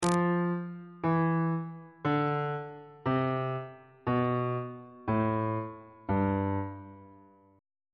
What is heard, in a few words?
mixolydian mode descending on bass clef